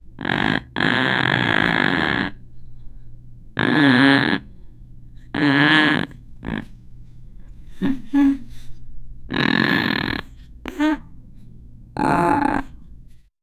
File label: sound of a woman farting; sound effect; body sounds